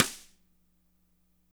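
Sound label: medium snare; Spectrasonics; Burning Grooves CD2; DRY KIT 4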